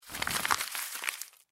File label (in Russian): zvuk smiatoi bumagi